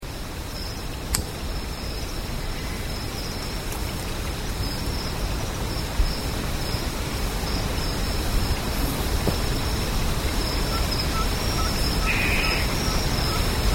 Tyto alba, Kerkuil; uilen; Tytonidae

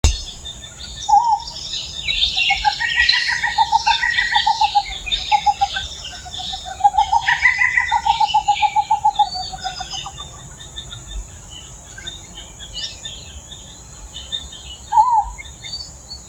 Athene blewitti; Bossteenuil; uilen; Strigidae

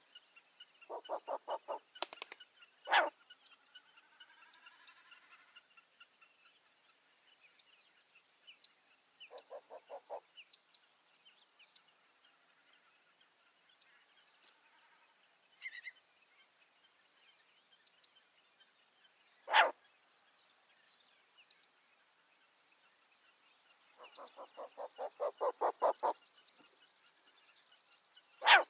Asio flammeus; Velduil; uilen; Strigidae

